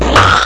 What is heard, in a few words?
snort; Show Doze Fonts 1.0.1